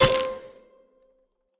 match sequence sfx; Candy Crush Friends

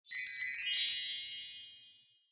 shimmer; Candy Crush Saga; seniority

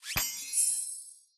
pregame booster placement; Candy Crush Friends

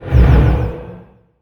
STAFF FINAL SPEAR; skill; FlyForInsanity

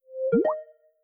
app invite; Microsoft Office; LYNC

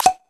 menu toggle; Candy Crush Friends

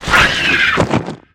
die; Trillipy; Fly For Insanity

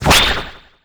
skill yoyo mad hurricane; FlyForInsanity

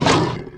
attack; Fly For Insanity

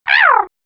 Wind Dmg; RUGAL; Fly For Insanity

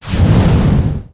Skill fire magic; FlyForInsanity

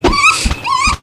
Npc Fly bat Dmg; FlyForInsanity

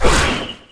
skill wand speed decrease; FlyForInsanity

